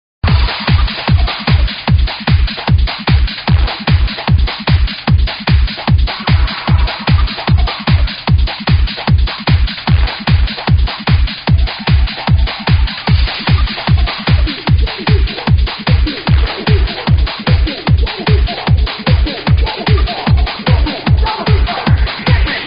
kick; multitrax

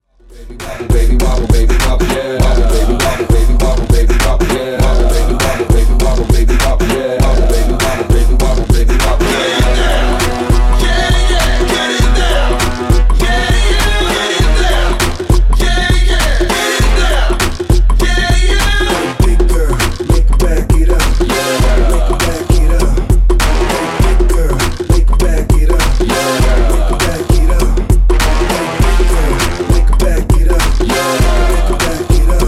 funk; powermusic

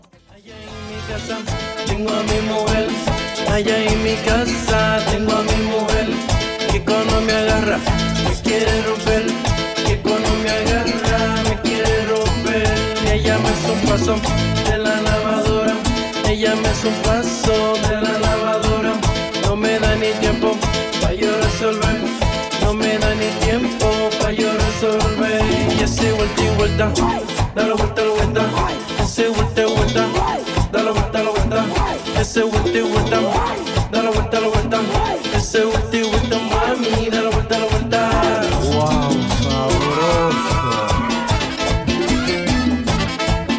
latin explosion; multitrax

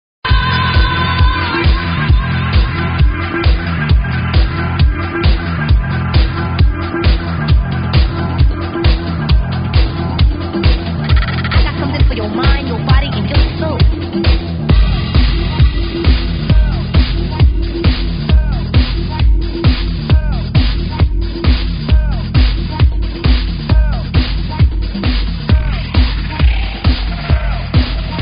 kick; multitrax

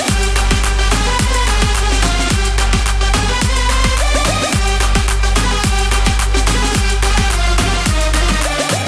funk; multitrax

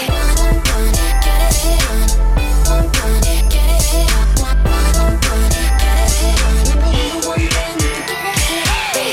funk; multitrax

